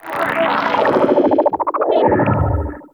Anima Switch Transition; Warframe; Warframes; Anima Animus

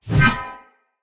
Shield Whoosh; Weapons; Enemy; Shields; Sinecure Shield

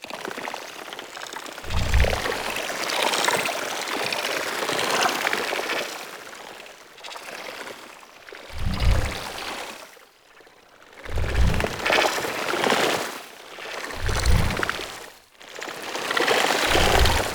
Mushroom Loop; Warframe; Nokko Colony; Ambience

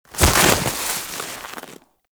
Animal Despawn Snow Dive; Hunting Critters; Foley

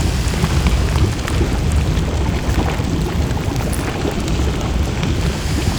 Lava Loop; Warframe; Grineer

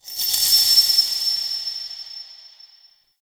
Interior Orbital Station Metal Shake; Warframe; Tau

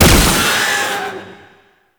Spike Spawn, Incarnon Fist Claw; Warframe; Tenno

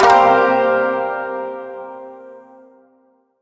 Shawzin Blues Chord K; Chords; Warframe

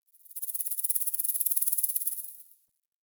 Forest Creature Rattle High; Warframe; Grineer

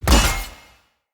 Vision Foley; Bromius; Synod; Envoy

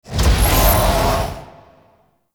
Deployment Cannon Loading Card Pre Launch; Warframe; Hub; Tau

